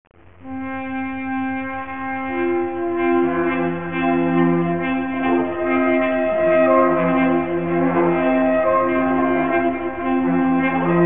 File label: Voice Of The Carnyx